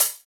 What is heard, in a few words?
Hhat, HiHat; Equipped Music; Loungin House